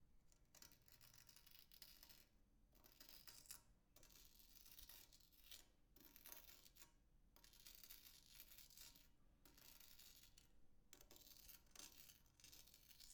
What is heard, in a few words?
Grinding Sugar